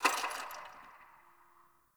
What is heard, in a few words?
Ice Crack One Off; Warframe; Ambience; Nineteen Ninety Nine